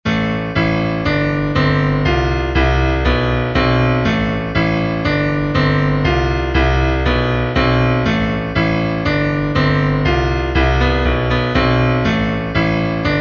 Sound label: Yamaha Dance Kit Drum D